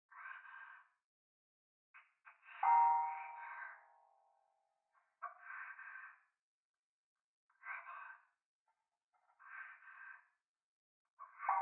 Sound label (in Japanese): トイレ 左耳 密着 処女 喘ぎ 寺